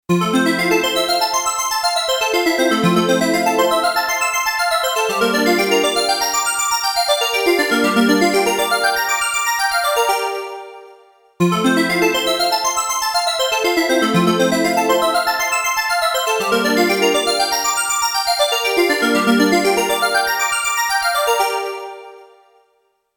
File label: magical ringtone